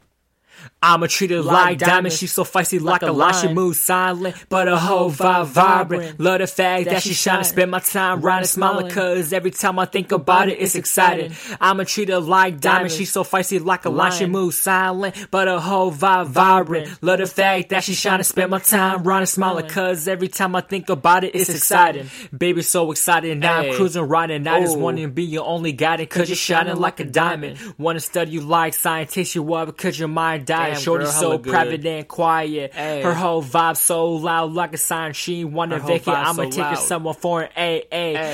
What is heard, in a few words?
(Acapella); Male; Rapping